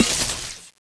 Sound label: coilhammer draw; Vaas